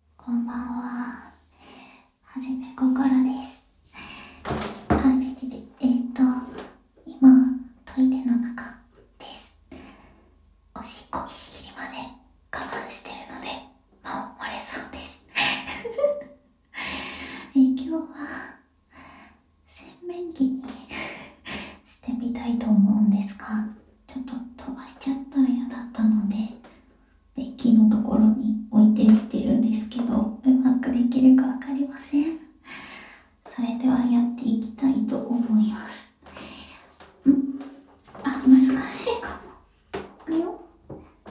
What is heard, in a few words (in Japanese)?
洗面器に おトイレで オシッコですッ